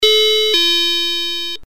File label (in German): 2Klang